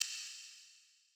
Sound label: Shakers; One Shots; Touch Loops; CosmicFunk